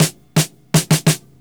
DRMROLL; E-Lab; X Static Goldmine 2

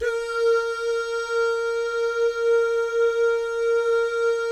"doo" guys, Vocal Jazz Fx

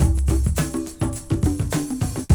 D3 BREAK

